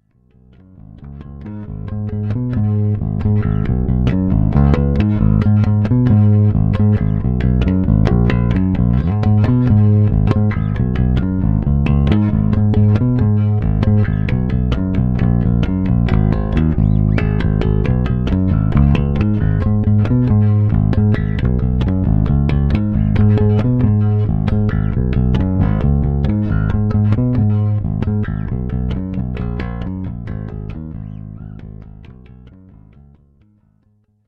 f bass vf5 fingerstyle neck pu passiv, bass

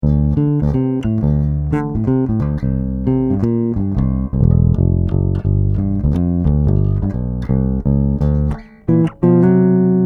mayones cs patriot cl5 neck, bass

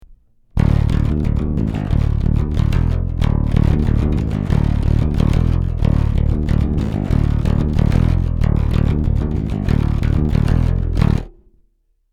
esh pu seriell piezo, bass

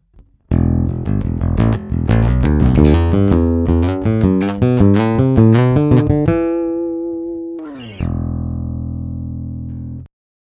martin b 28 kv sign, bass

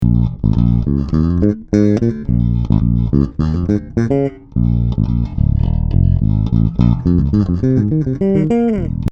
tribute5 both parallel passiv

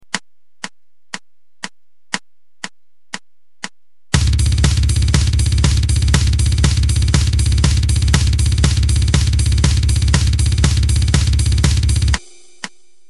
doublebass

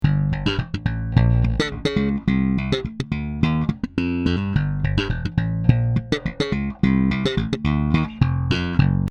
slap, bass; bassart relicmaster

